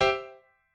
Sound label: piano